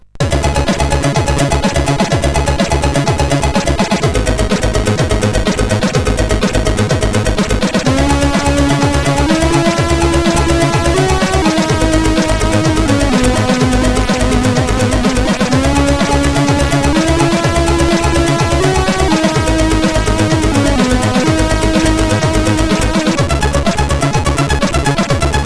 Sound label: tune sid CSG8580R5; Siebold Markus